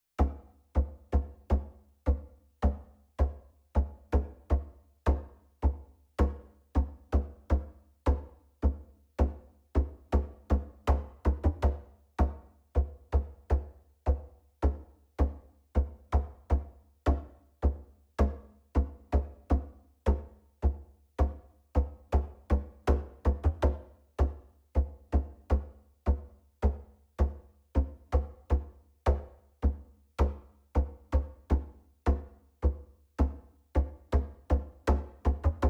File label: frame Drum Low